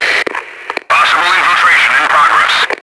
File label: merc noise; enemy; Max Payne